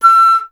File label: flute E; Miroslav Vitous - Solo Instruments VOL-2; Solo lnst. II